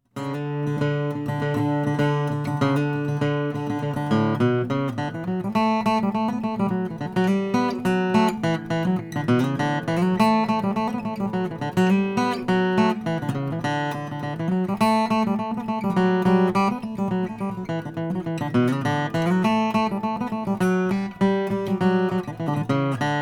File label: 002h brazilian rosewood, guitars; collings